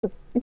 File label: Young Gulp; Link gulping a drink; OOT